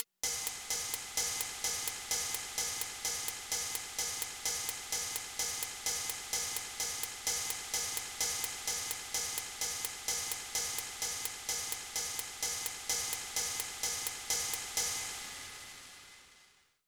Constant Hats

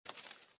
Puff; iStunt 2

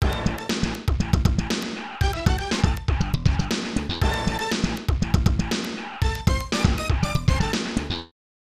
3rd Place Finish; Exhaust Heat; SNES

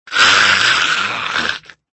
Zombie Death

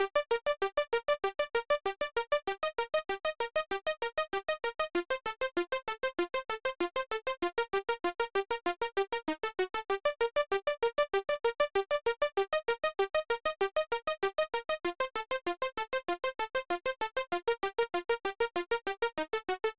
Synth Sequence 8 Bars; Vostok Inc.

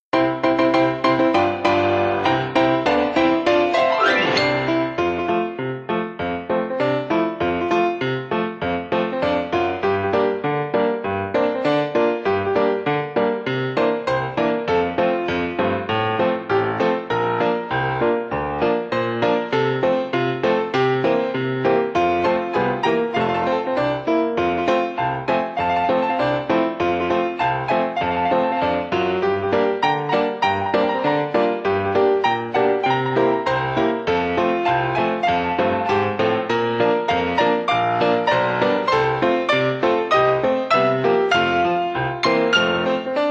Piano; baron fur is gonna fly

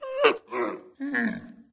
Sfx - Llama Mom Panic; Farm Mania 2

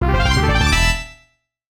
Found Hidden Item; Mario Golf Advance Tour; GBA

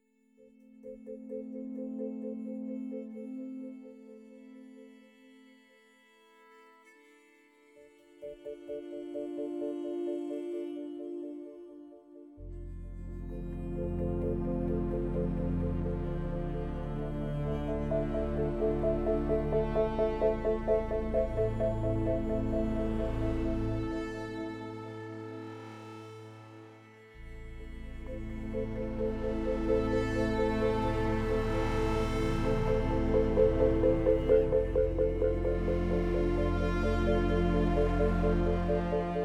Exploration General Electronic

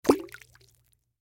Ingredient Splash; Secrets of Magic: The Book of Spells

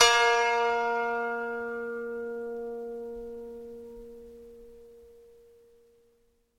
koto; Summer Catchers

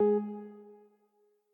Quasar Move G; Mixolumia

